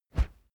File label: whoosh wood cornered; swooshes-swishes